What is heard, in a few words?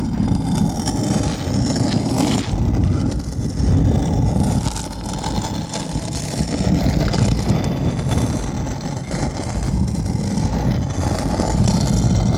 masonry slide loop